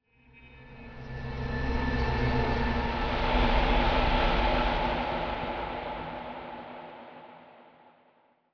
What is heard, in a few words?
Night Music; undead overlord linux macos windows gamerip 2014